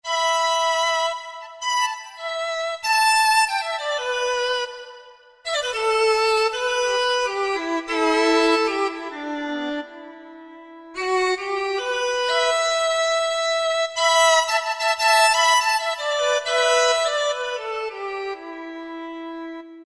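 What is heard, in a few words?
Ethnic violin x3, Roland SR-JV80-05 World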